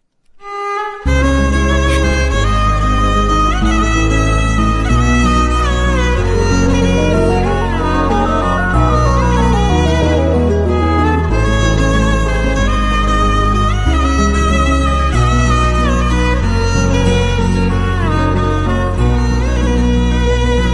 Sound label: love bgm; Puneeth Rajkumar